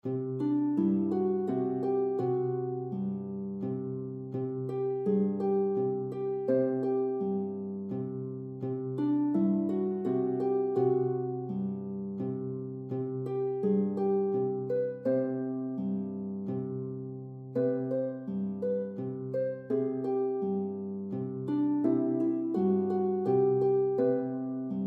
EASTERN SONG, lute; easy lute pieces vol 2

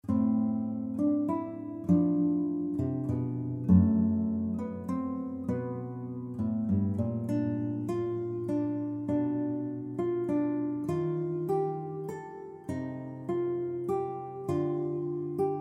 guitar; TIME WILL HEAL; two part species counterpoint guitar compositions

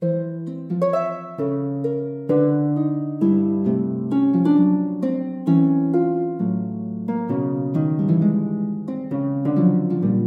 AYRE; harvest

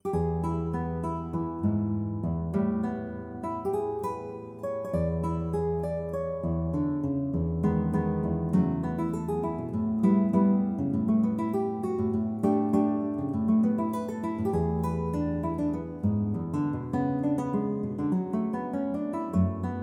BLUES, solo guitar; EXPECTATION

